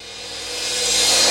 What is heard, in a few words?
Cymbals Reverse Crash; Vengeance Samples